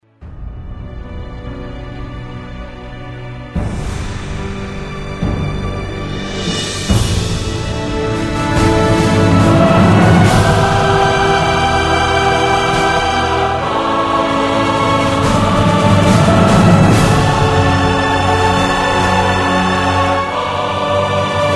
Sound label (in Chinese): 大气的背景音乐